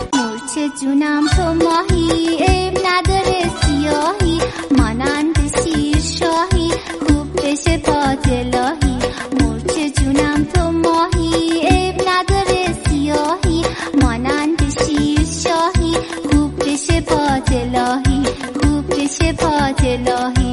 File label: fun music